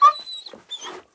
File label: Dog die Whine